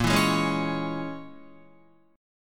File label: Bb Minor 7th Flat 5th, Guitar; Bbm7b5 strummed